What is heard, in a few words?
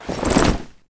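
grunt movement foley loud long; Titanfall 2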